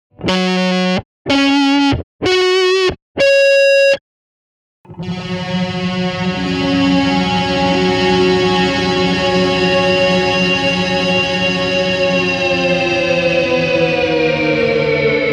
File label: Time Bending Fuzz; meris polymoon